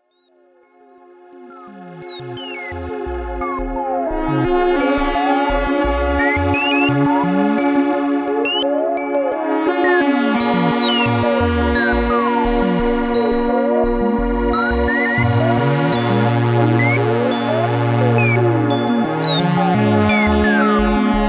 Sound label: Sonic Six